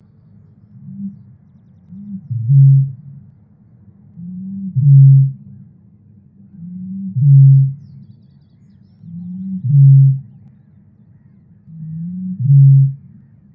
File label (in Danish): Roerdrum; Biodiversitet